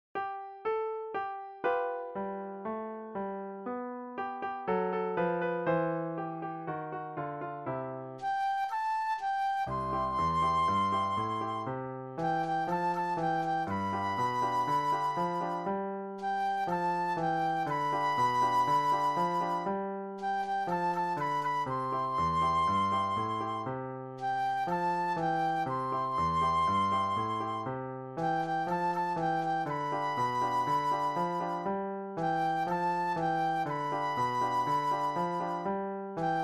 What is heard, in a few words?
Flute Solo